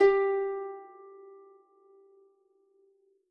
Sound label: G3 Harp